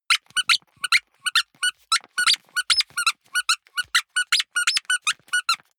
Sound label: squeaker squeeze sequence slow